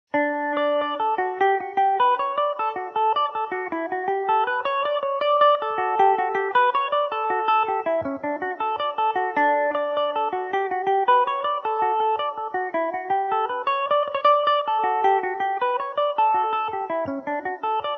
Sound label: benedicts rambles, mandolin; Revelation Jaguar electric mandolin